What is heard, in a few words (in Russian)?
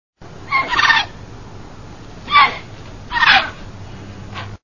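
caplja seraja, grey heron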